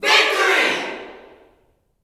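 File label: Victory shout, Vocal Gospel yell shout; Spectrasonics; Vocal Planet Vol.1 Gospel; akai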